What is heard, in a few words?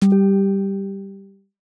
chapter bell; historical